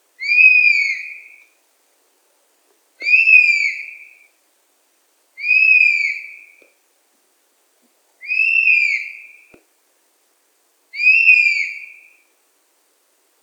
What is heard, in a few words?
Pernis apivorus; Wespendief; roofvogels; Accipitrinae; Accipitres; Accipitidae